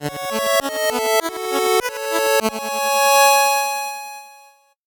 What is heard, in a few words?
8bit jingle normal